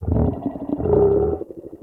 Venus Landscape Mushroom Creak; Ambience; Warframe; Venus Open World

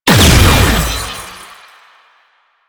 Research Pod Shield Destroyed; JadeShadows; Ascension

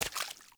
Walk Water, Footsteps; Enemy; Mockeries; Mockery

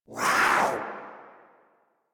Kavat Vocal Attack Short; Warframe; Khora

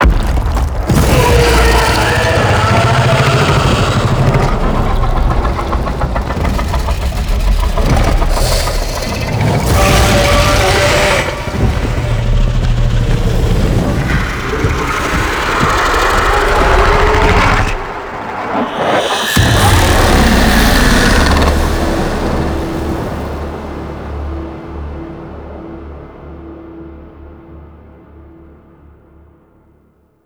Infested Micro Planet Moon Monster Spawn Fire; Warframe; Microplanet Sun Monster

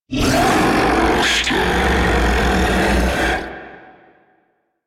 Octopede Attack; Warframe

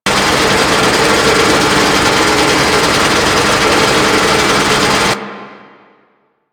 Mining Machine Loop; Warframe; Ambience; Grineer

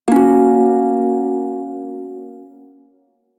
Prime Shawzin Hirajoshi Chord H; Music; Warframe

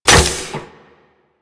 Rocket Launcher Reload

